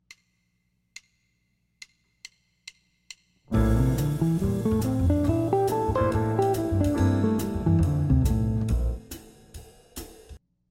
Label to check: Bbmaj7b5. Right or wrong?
Right